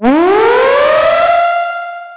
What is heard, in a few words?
tornado warning